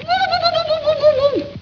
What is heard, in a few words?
whop